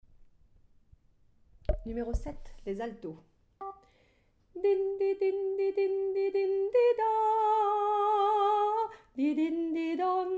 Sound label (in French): Alto; pop